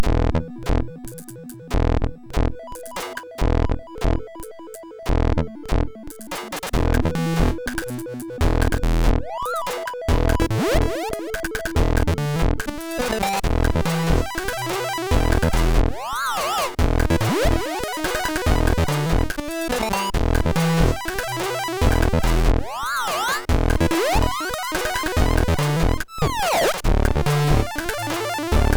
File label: City Noises, sid MOS6581R3